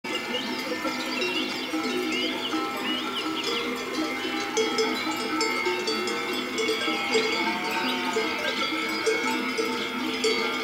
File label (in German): Hörprobe Kuhglocken; Senn